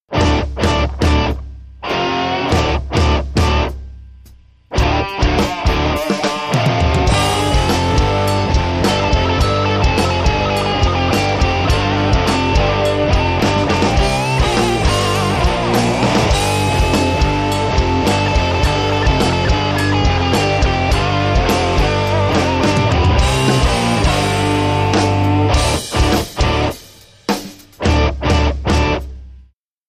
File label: Rock Hammer